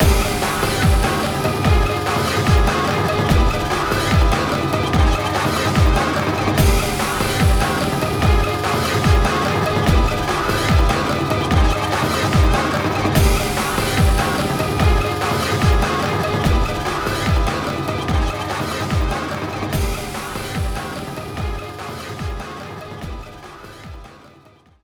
Old Temple Stage Japan; Street Fighter IV; X360

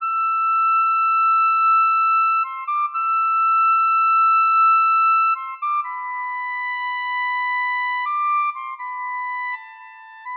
City Flute Busker; Xenoblade Chronicles 3

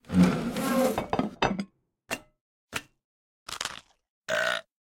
sequence clementine slice

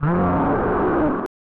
Roar; The Incredible Hulk; SNES

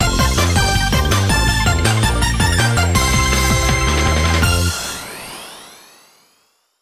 Level Complete!; ape escape sarusaru big mission

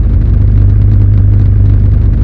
Pagani Huayra Idle

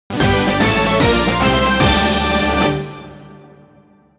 Jingle Dungeon Clear; Puzzle & Dragons Gold

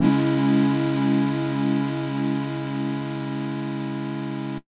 Retry Jingle (Pixel)

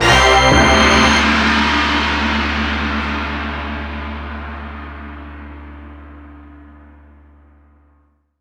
Normal Ranking; Bomber Mansion; bomberman jetters

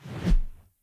transition

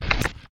Suck

